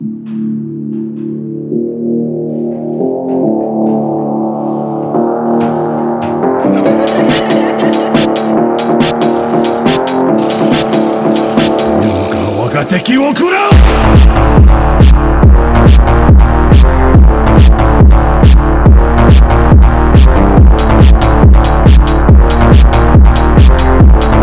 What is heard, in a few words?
phonk musics